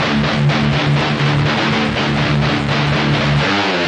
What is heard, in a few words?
123 death metal guitar; GUITAR LOOPS